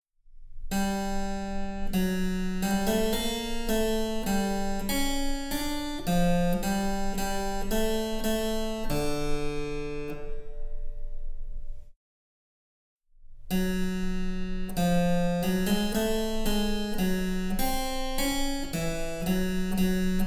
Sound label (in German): Cembalo; Mark Lindley WTC 1